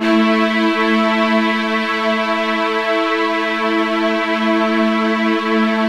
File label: Cream Pad (5th)